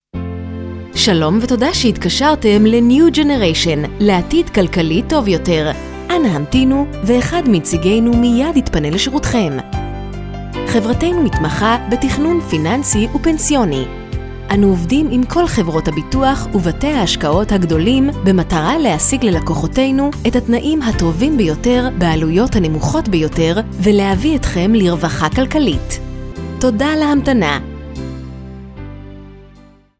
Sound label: business and piano; Music On Hold; New generation 2 – פאנטון